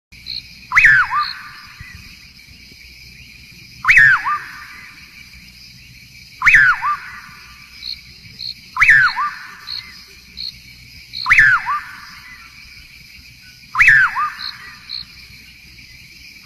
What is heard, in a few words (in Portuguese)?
Canto do bacurau de rabo branco